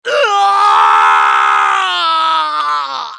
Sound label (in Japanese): ソルジャー男 死亡